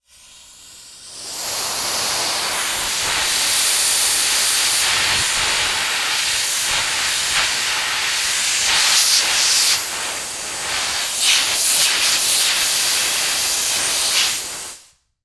Industry Tool Air Escaping From Air Compressor Blower Nozzle